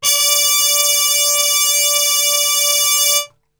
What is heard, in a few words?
D 3 THM, Brass Super Section, TRP HAR MUTE; Best Service; 90 s Sample CDs